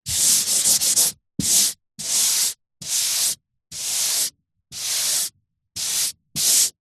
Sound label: eraser blackboard rubs slow; office